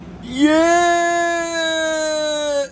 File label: yeeeeeeeh scream